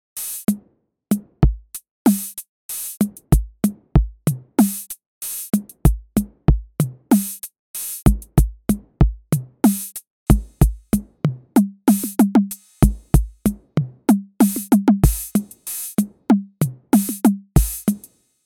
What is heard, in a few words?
TR-606